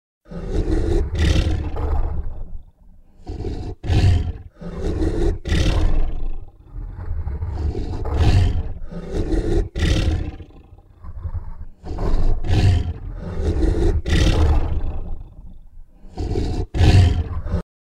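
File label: T-rex sound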